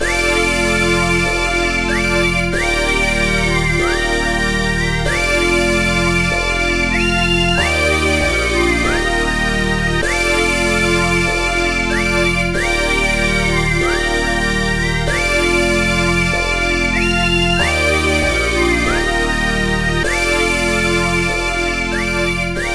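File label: background music sad; My Frogger Toy Trials; Nintendo DS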